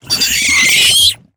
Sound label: Exploding Bloatbat Attack